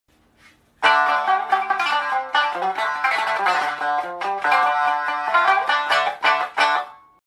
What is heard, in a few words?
Shamisen